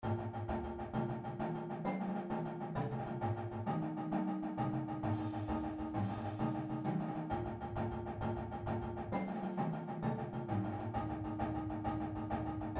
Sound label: Accents Drags, Drumline Exercise; ensemble; pdlmusic; Drumline Ensemble Series